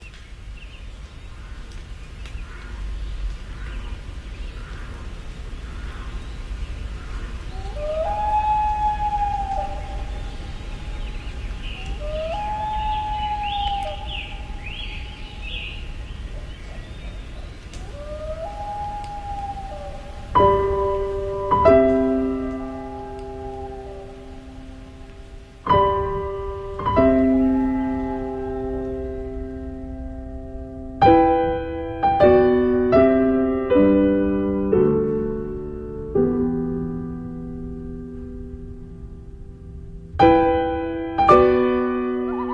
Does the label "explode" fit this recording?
No